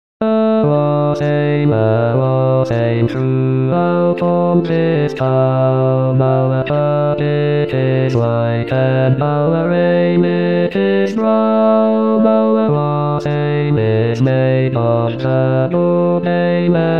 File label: Gower Wassail Bass